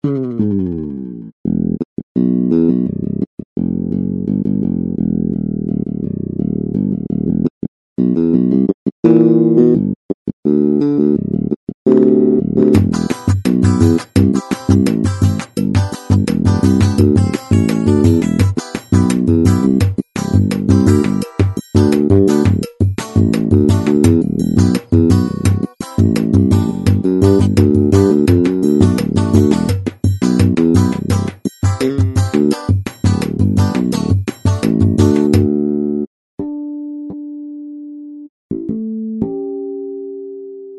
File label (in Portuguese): forró super bass